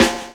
SD FUNK, SNARES; E-Lab; X Static Goldmine 2

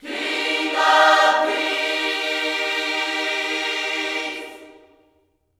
KINGS, Gospel; Spectrasonics; Vocal Planet Vol.1 Gospel; HALLELUJAH

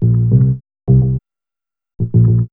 B-LOOP

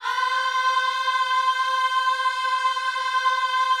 OHS C 5, Gospel; Spectrasonics; Vocal Planet Vol.1 Gospel; akai

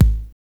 BD; E-Lab; X Static Goldmine 1

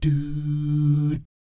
DOO SFZ, Vocal Jazz Fx

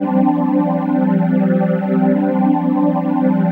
PHASE PAD, ATMO PADS; Best Service; XX Large

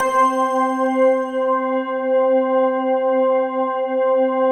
PAD, Pads; Best Service; XX Large

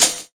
TAMB; MLOP DRUM; E-Lab; X Static Goldmine 2; akai

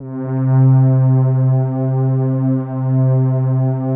pad, atmo pads; Best Service; XX Large

